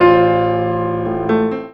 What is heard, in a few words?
PIA, PIANOS; USB Soundscan vol.02; AKAI